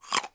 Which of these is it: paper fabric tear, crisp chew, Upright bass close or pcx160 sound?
crisp chew